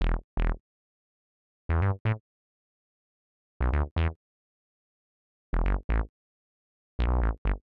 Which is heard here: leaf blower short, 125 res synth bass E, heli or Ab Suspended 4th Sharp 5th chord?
125 res synth bass E